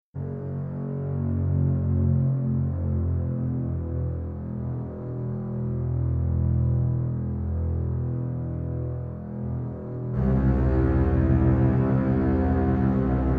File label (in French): Contrebasse solo